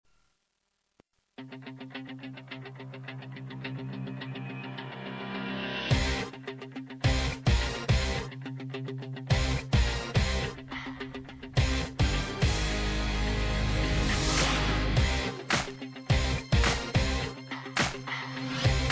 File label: instrumental